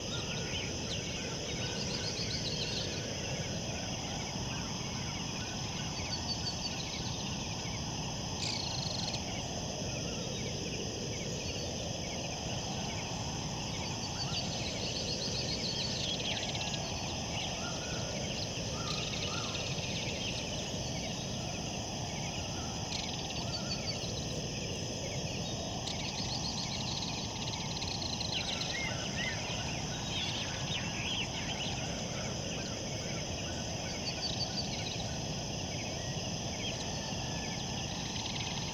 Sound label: Ambience Plains